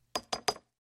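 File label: quill pen and inkwell sounds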